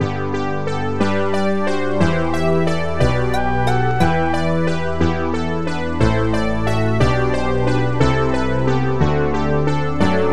chiffer lead

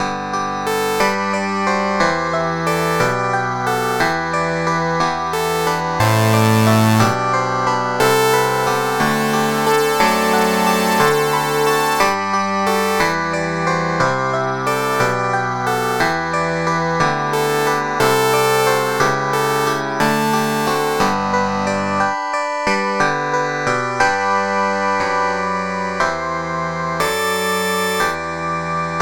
charang

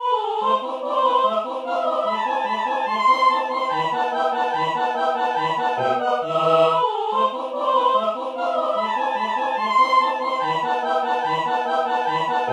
ahh choir

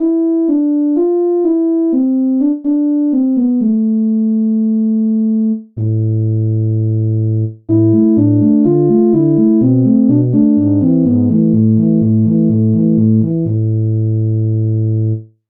tuba

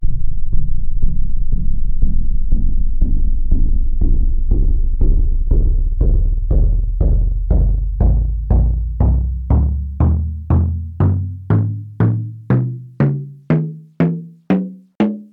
taiko drum; tonleiter

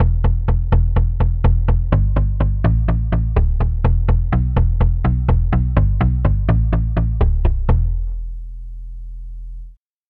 palm muted guitar bass

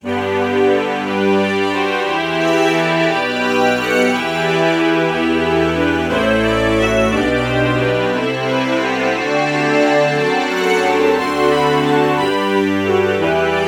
alto saxophone violin true bach morph

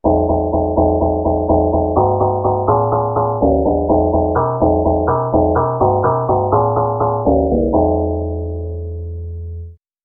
agogo bass

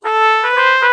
ah trumpet lpc true melody morph

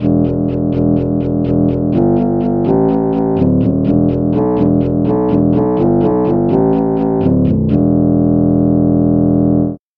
shenai bass

